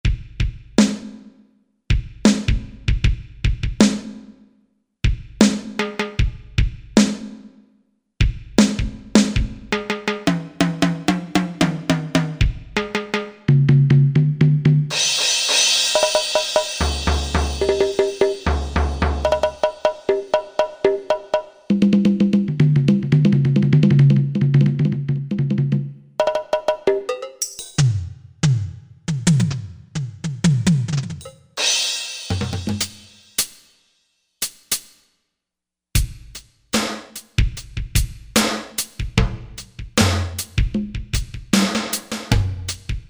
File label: New Pcm Drums; NewPcmDrmz